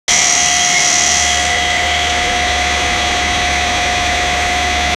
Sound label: create sound for construc; advertising